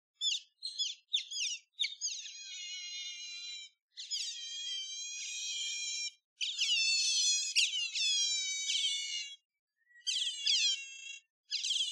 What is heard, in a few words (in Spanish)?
nutrias; basel; choir of species